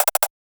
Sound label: Allert Place; FREE SFX PACK VOL 1